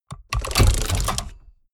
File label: mechanics medium rattle; industrie wirtschaft; mechanik